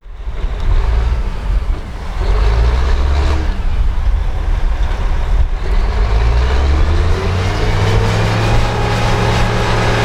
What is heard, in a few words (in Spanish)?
obras, obras de construcción